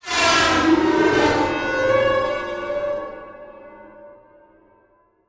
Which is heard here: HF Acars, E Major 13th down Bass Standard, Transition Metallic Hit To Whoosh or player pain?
Transition Metallic Hit To Whoosh